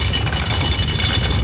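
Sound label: table raise loop; doors elevators; Star Wars Obi-Wan; XBOX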